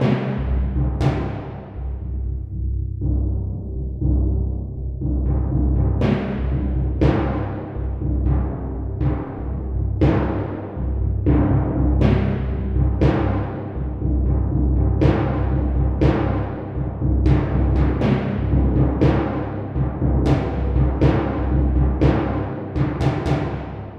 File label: timpani